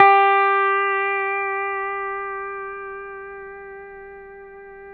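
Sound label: pedal steel G4 left; PEDSTL NOTES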